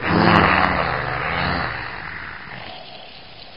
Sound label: zombie idle; svencoop; custom monsters